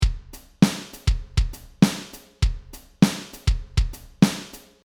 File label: Ska 100bpm